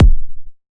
Kick G; Massive

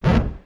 Buzzer

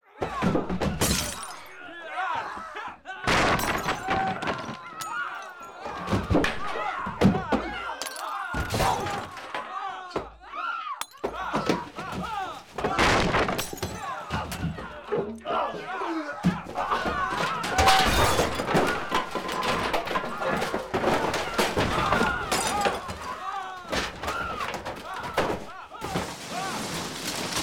Bar Brawl Close

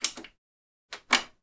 On Off Button

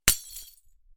Glass

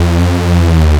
Hoover

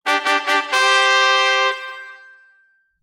Triumphant Success

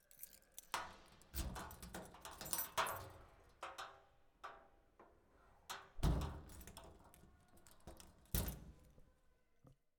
School Locker

